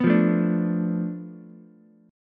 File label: B Minor 7th Flat 5th B Ukulele; Bm7b5; strummed; JGuitar